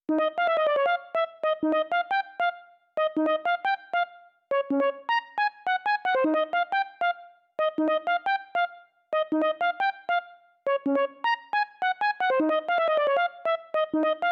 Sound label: Melody; chippy